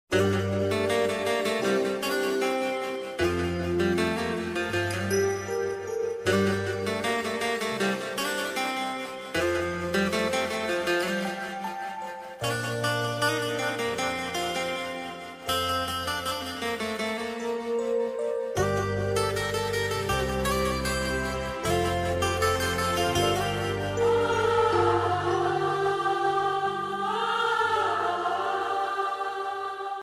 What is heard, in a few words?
love ringtone